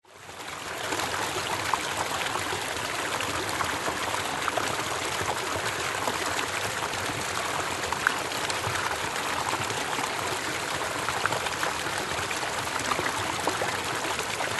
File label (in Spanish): naturaleza para relajarse